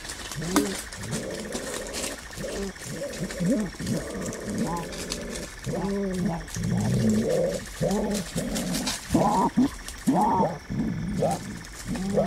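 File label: mangust, mongoose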